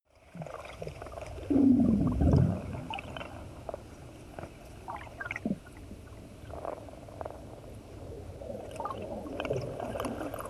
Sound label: water fx gurgling